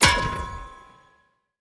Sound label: goal miss bounce, Ball Collision Goal Frame